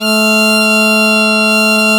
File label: BP BASS F3, Celtic; USB Soundscan vol.42 - Celtic Flavours; AKAI